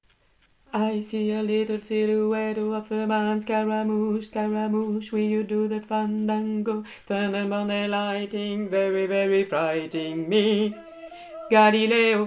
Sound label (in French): bassi